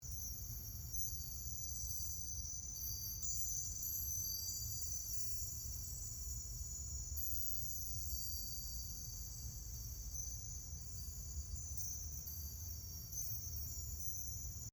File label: Floating Symbol Ambience; Quad Fronts1; Journey